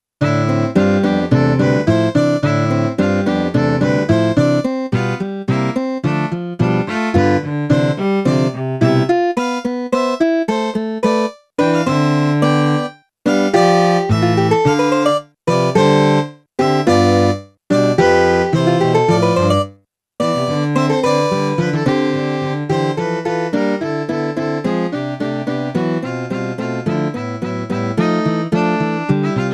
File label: Trio